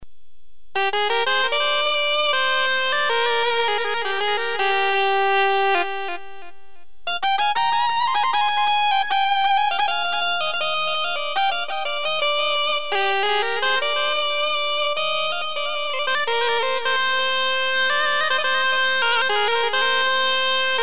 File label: zurna lead